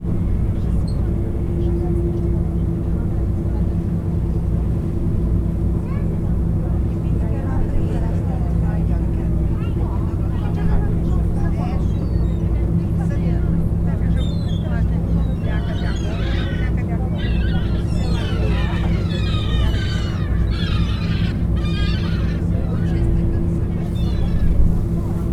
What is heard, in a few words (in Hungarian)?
odaút, sirályok, beszéd, komp; thassos